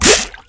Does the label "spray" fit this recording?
Yes